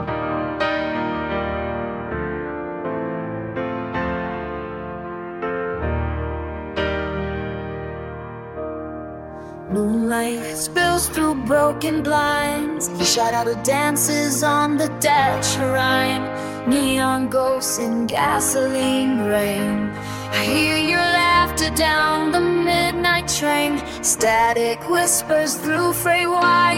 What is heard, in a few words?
Jazzy Nightclub Vibe; DiffRhythm